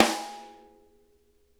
rim mf R, snare; Sonic Reality; Interactive Drum Kits

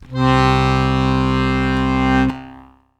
ACCORD BASS C 1

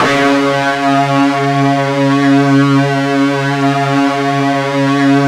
FUZZ C#2, Guitar; East West; Steve Stevens Guitar Samples Collection

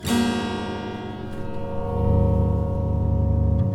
guitar getting closer; random and out of place

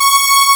BIG SAW C#5, Analog; E-MU Formula 4000 Series Vol. 3; Analog Odyssey; Synth Builder

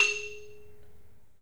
MARIMBA; 3D Sound; 3D Keys VOL-1

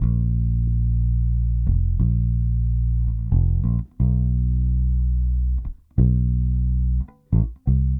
Bass, 70s Analog Funk; Sonic Foundry (Sony Creative Software); What it Is!

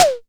Disco Tom - Short; Tama TS-206

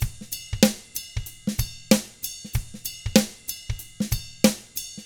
FUNK, Drums; ILIO; Double Platinum Drums 1

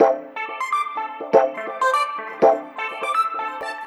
Funktek Flowin; Sonic Foundry (Sony Creative Software)

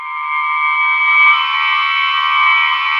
indicator; Spectrasonics; Bizarre Guitar

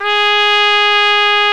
trumpet; Best Service Gigapack 1

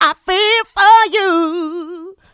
mixed vocals; Houseworx

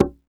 CONGA; Best Service; Dance Mega Drums CD2; CONGAS

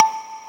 digi decay

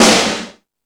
All Snares, E-MU Pro-Cussion

